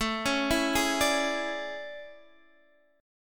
A Major 11th, down Guitar Drop D

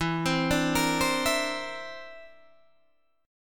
D 7th Suspended 2nd Sharp 5th E

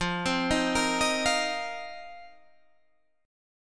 F 7th Suspended 4th F down Guitar Half Step Down

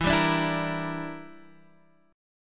E Minor 7th Sharp 11th; strummed; JGuitar

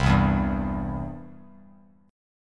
C Major, Bass Standard; strummed; JGuitar

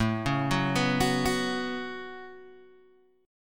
A 6th Add 9th A down Guitar Open G

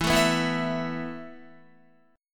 E Suspended 4th E, down, Guitar; strummed; Esus4; 4E,4A,5D,5G,5C,6F

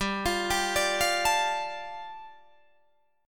G 7th Suspended 2nd, Guitar DADGAD

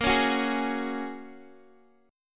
Bb Minor 7th Sharp 5th, Guitar, Open D

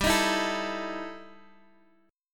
E Minor Major 7th Double Flat 5th, G

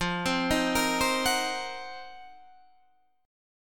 C Minor 7th Flat 5th F, chord; Cm7b5/F; arpeggiated

